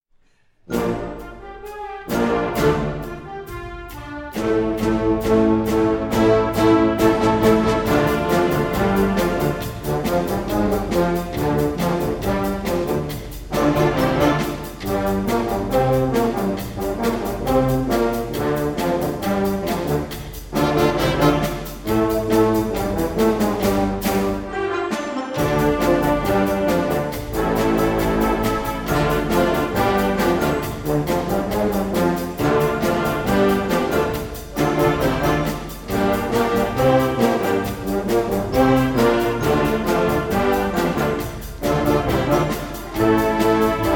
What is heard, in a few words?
Brass in the Basement